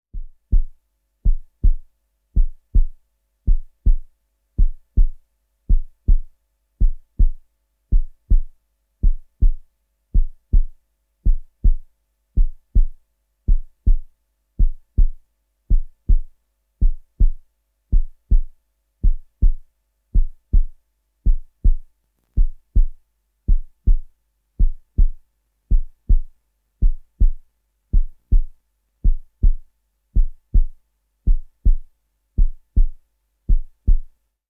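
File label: Heartbeat Medium; KMP Film Video Sound Effects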